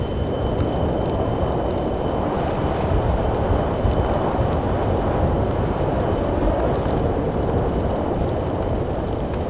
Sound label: Unstable Bat Rock Item Fly; TANK; 3Souls